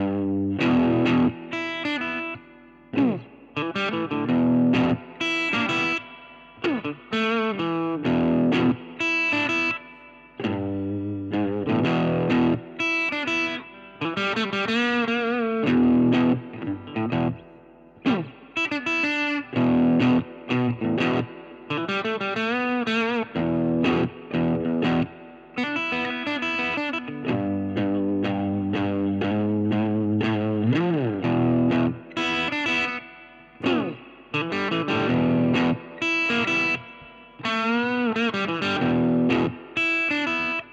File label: Supro 64 Reverb Crunch little Reverb